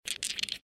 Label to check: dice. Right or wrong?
Right